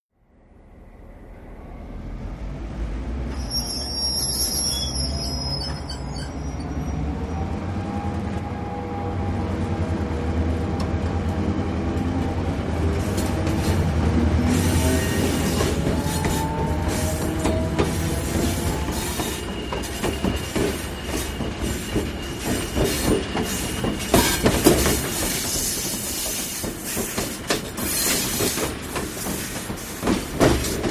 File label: Train Wheels Squeak, diesel